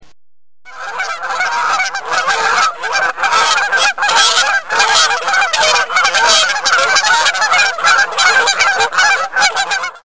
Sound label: Geese